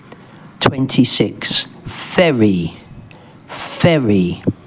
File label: ferry; transport သယ်ယူပို့ဆောင်ရေး